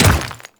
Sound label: Dirt Step; Enemies; Footsteps; Warframe; Grineer Royal Guard